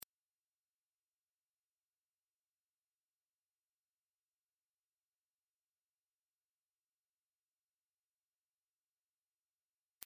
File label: high freq